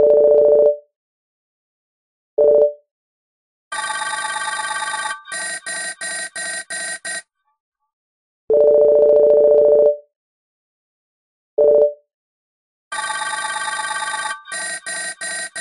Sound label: Nokia remix ringtone